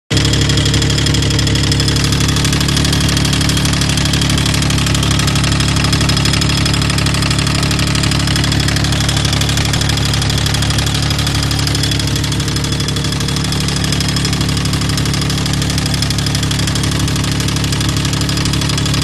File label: engine making weird noise; engine drivetrain forced induction